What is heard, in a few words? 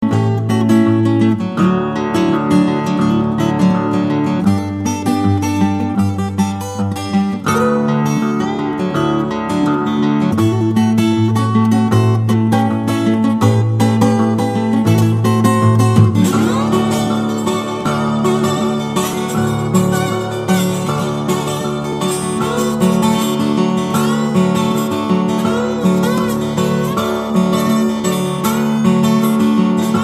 one guitar